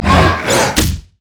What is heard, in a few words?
nashor attack initial; LoL; monsters